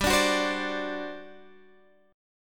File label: G Major 7th Suspended 4th, G